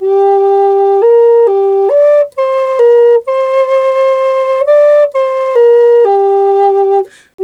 G 130, FLU RIFFS, Didgeridoo and Other Primitive Instruments; Big Fish Audio - Didgeridoo and Other Primitive Instruments